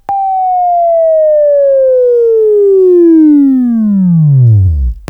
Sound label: Buzz; Sweeping Training